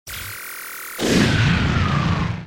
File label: bomb